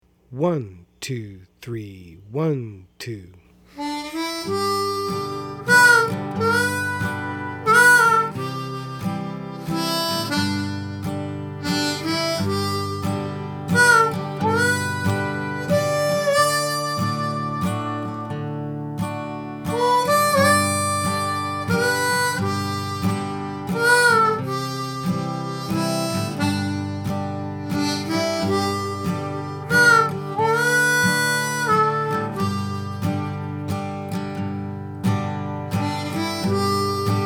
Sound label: Fast